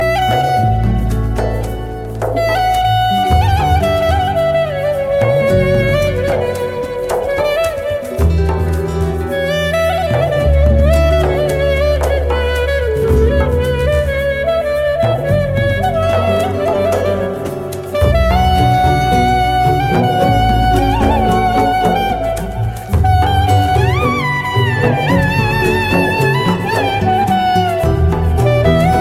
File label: Clarinet